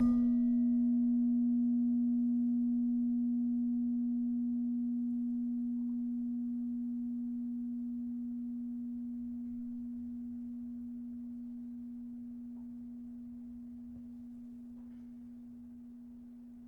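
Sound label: Slentem B3, gravity harps tuned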